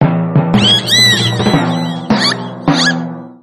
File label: Check Point Display; Black Knight Sword